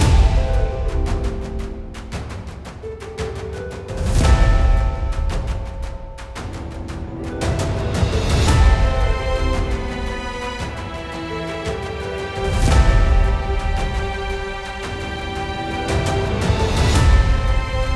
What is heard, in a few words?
Forward without piano